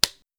click; edgar

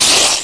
claw

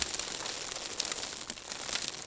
dirt; stunt rally; hit

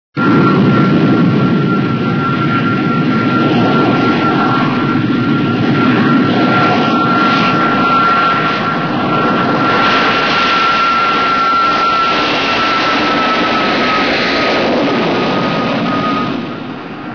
jet; race into space

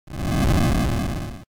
fireball; project hawk

